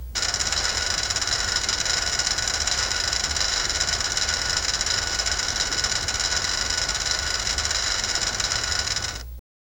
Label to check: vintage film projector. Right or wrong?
Right